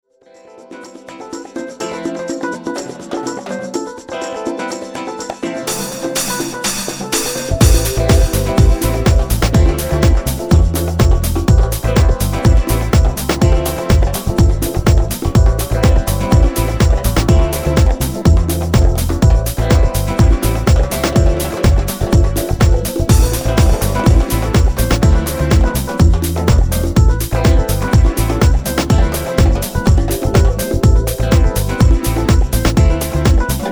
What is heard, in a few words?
Bass